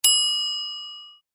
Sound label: charge full; BLU G60